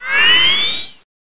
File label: goggles